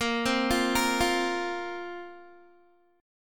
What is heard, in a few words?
A sharp Suspended 2nd down Guitar; A#sus2 arpeggiated